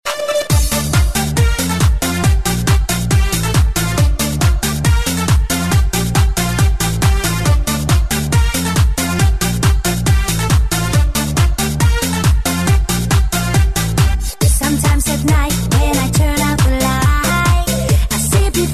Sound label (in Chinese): 欢快节奏 劲爆节奏